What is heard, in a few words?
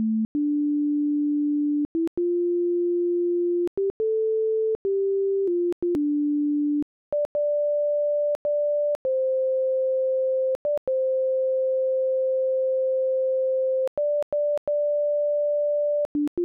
house